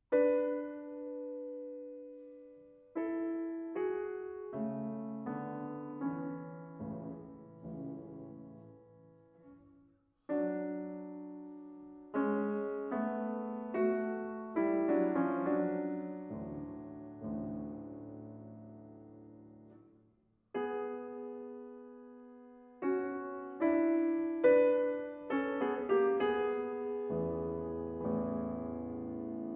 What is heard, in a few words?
Old Pieces For Piano Ballade